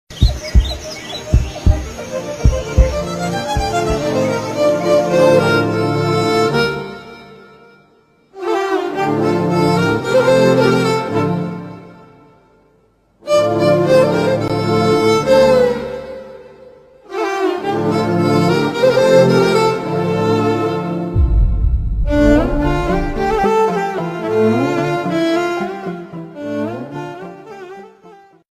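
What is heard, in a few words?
massive background music violin tamil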